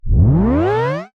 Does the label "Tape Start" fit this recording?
Yes